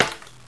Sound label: xbow hit